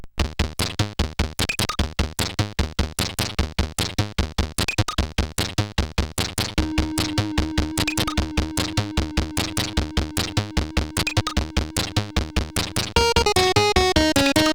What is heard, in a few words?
sid CSG8580R5